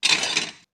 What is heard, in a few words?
dishes